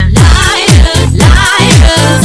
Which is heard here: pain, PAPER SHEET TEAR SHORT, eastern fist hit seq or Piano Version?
pain